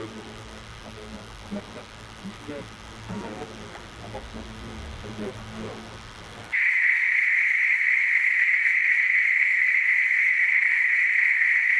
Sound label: plague quarantime breache, warning